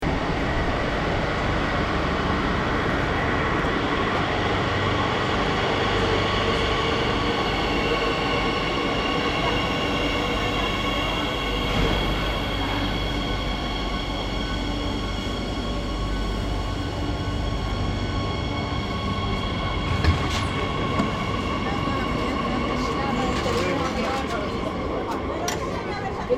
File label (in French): carte postale sonore